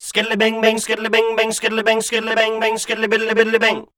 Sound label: scat, voice